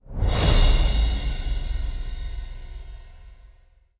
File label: Transition 0.5 Second